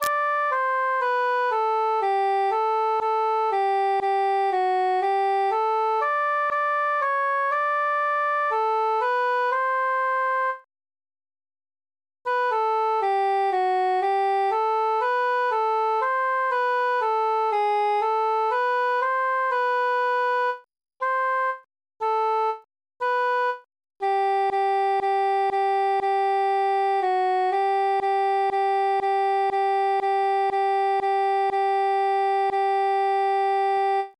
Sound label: cantus solo